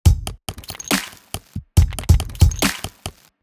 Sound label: Drumloop, Dubstep; GhostHack